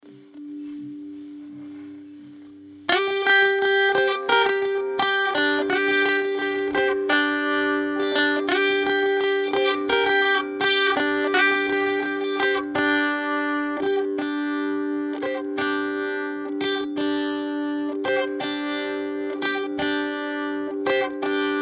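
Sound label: G 170 guitar riff trash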